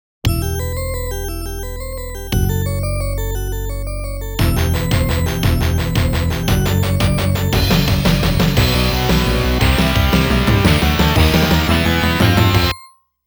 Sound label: COUNT DOWN (Continue); THE KING OF FIGHTERS '97 GLOBAL MATCH Soundtrack